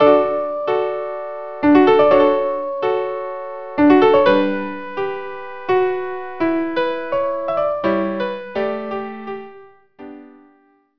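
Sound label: violin viola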